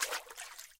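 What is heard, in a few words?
splash of fish in water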